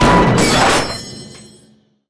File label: freeze death gib; Quake 4 Alpha; strogg general